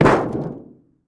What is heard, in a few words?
Car Hood Drop On Groun